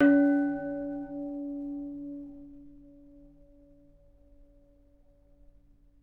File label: single bright metallic boat bell ding; bells